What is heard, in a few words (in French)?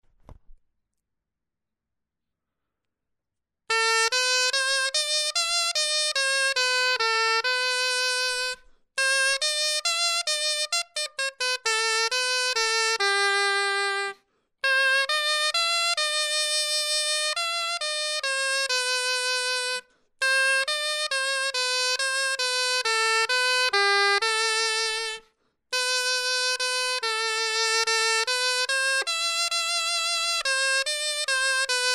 clarin etude